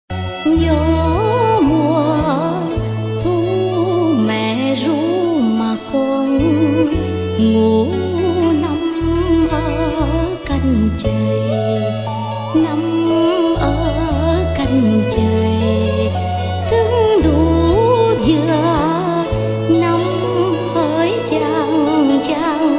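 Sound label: lullaby Southern Vietnam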